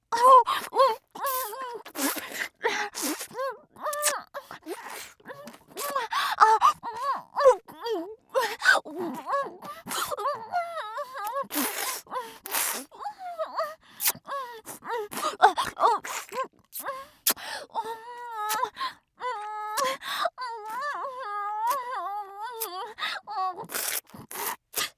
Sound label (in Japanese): BGV(フェラ)